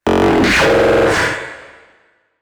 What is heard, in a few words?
explosive zap; sound ideas